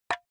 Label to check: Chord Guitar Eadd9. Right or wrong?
Wrong